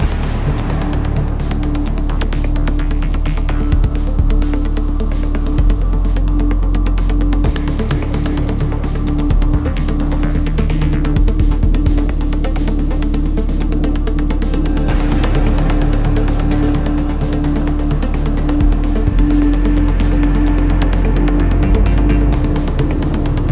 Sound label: Incoming Sniper; modern combat 5 blackout